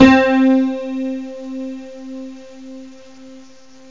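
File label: honky tonk, tones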